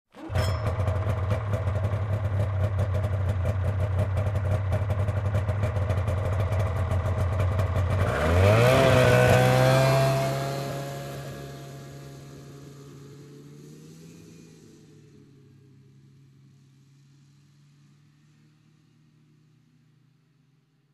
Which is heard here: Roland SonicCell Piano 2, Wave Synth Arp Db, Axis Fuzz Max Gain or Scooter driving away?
Scooter driving away